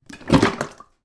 food plastic bottle of olive oil movement

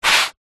footstep sneaker scuff movement paved ground; foley; zapsplat

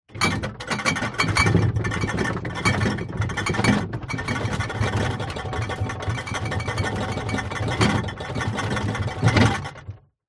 household drinks fridge shake

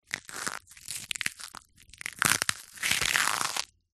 food frozen peas movement crush in hand; zapsplat

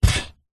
car brake pedal press down with foot engine off; vehicles; zapsplat

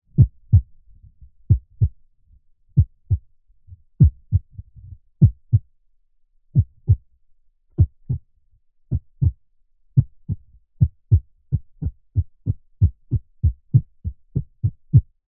heart beat through chest irregular getting faster; human; zapsplat

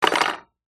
industrial metal hacksaw junior down on surface

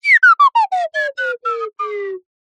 cartoon slide whistle descend stutter slow

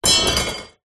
impacts metal pole small hit drop on metal rack

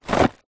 food candy inside card box shake movement; zapsplat

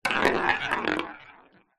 industrial ball bearing roll on wood floor; zapsplat

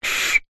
whistle blow weak broken mostly breath noise; foley

